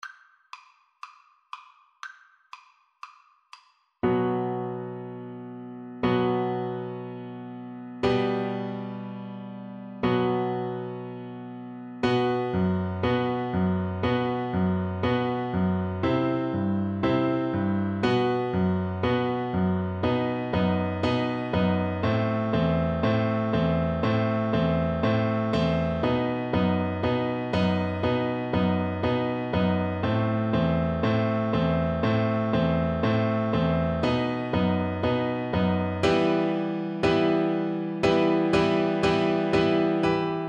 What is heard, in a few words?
hava nagila Oboe